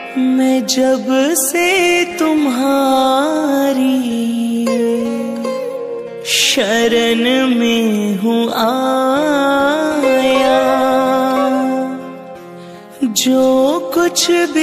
Krishna Bhajan Song Ringtone

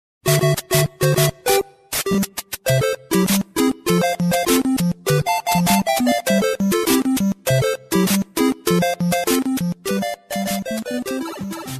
Game Music Ringtone

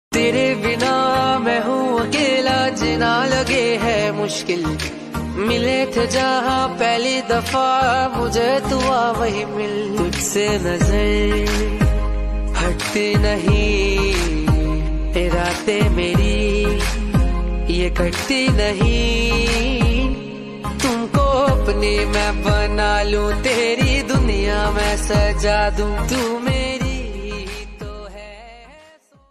Love Song Ringtone

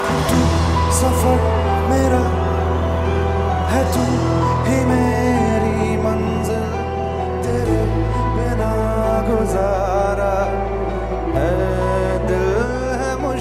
Hindi Song

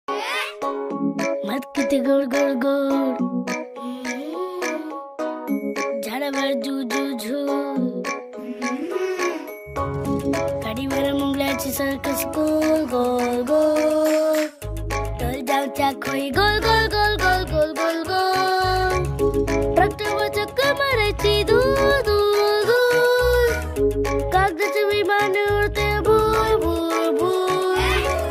Marathi Song Ringtone

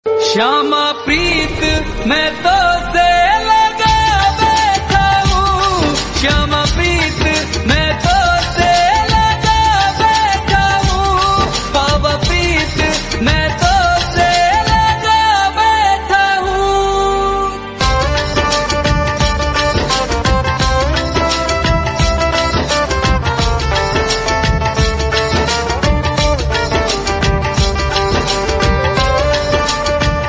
Devotional Hindi Ringtone

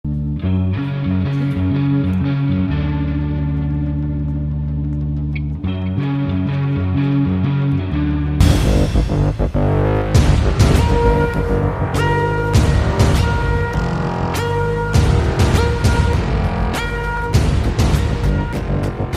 Theme Music Ringtone; Tiger 3

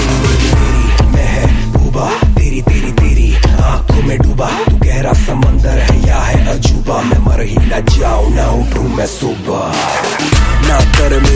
Remix Ringtone

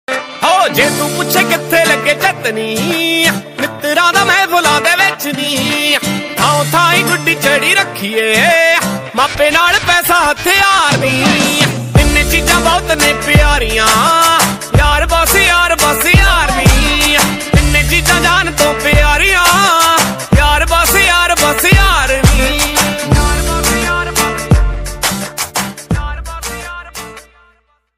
Song Ringtone

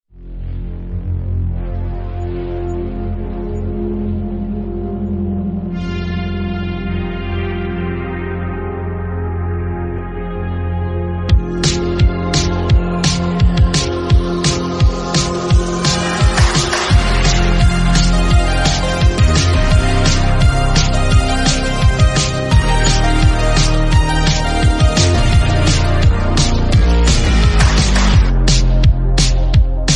Instrumental Ringtone

